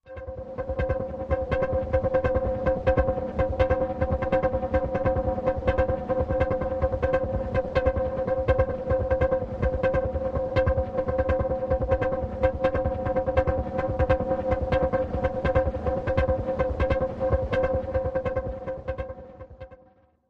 Tin Rainmaker, Machine, Vibration Metallic, Pipe, Hollow, Rhythm; probes